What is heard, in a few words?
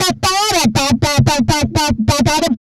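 vocals, Jungle; E-Lab; XTC Files of Jungle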